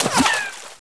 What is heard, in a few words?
WATER IMPACT